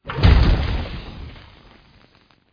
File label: lava smash; dwaarp